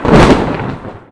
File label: lightning